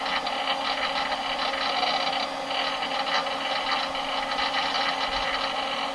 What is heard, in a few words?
Ambient Computer 8Bit